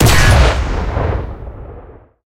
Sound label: cannon 45mm 20k shot, cannons tanks